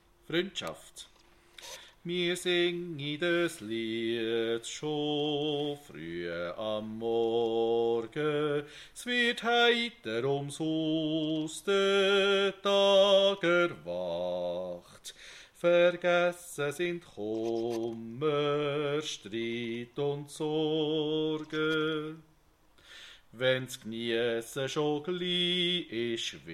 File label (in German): Bass